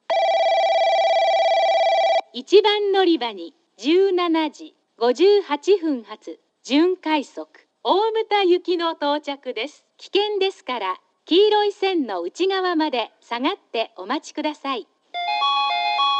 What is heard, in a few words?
semi rapid omuta, portable recording